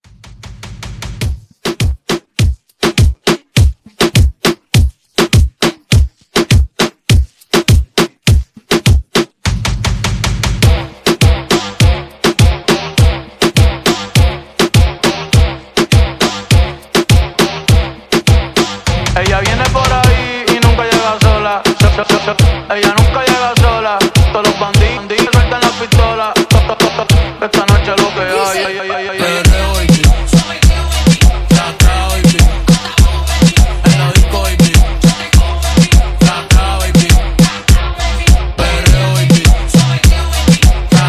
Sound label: Reggaeton Club 102